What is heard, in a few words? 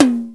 TOM, drumtraks